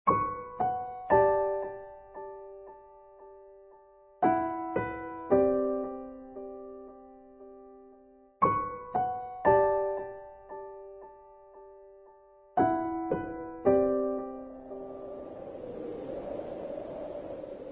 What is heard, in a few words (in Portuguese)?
tenso piano orquestra